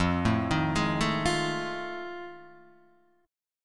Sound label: F 7th Sharp 9th, Guitar, DADGAD; F7#9 arpeggiated; 3,0,1,1,0,3